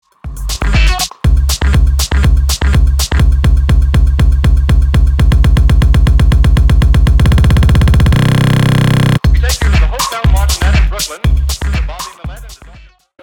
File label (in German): Loop mit Cut